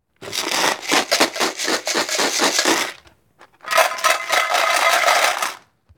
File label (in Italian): barattoli ballerini